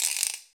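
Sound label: ratchet; Guiro Wood; Club-50 - Foundations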